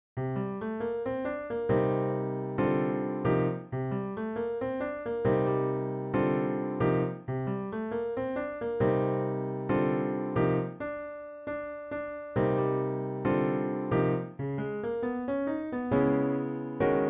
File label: C Dorian Cm7